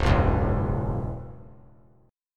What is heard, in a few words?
C sharp Minor Double Flat 5th C sharp, chord; C#mbb5; strummed; JGuitar